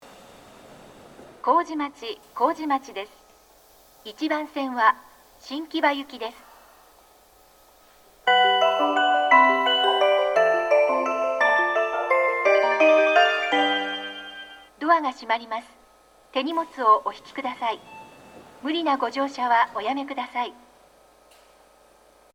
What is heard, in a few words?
kojimachi melody